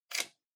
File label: RJ Wall Socket Lan Shutter Open